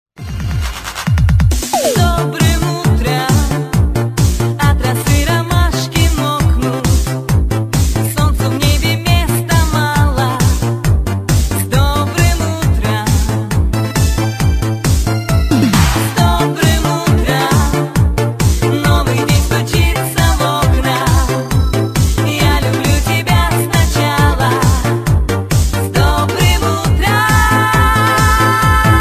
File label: arabic ringtone